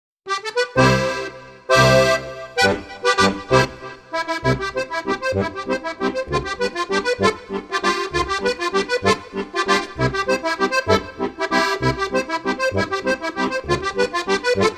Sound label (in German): walzer